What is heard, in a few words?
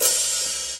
CHH, Dance Drums; Best Service ProSamples vol.15; AKAI